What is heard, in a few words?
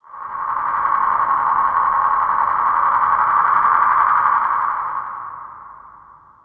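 distant growl, caves; ambient; Half Life 2; Episode 2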